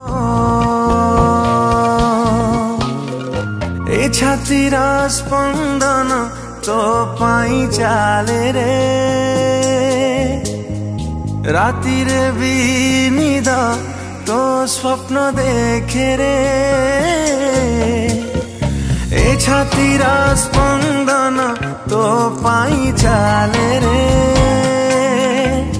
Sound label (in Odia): Biswas Odia New Sad Music